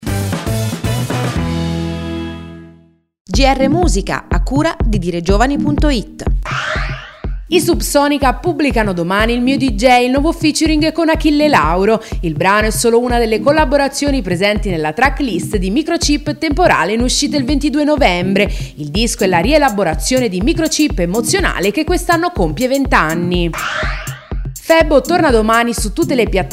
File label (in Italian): musica